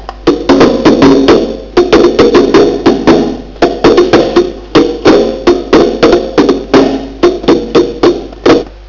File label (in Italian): bonghi